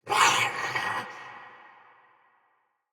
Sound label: pain, soldier; Battle; Mendicant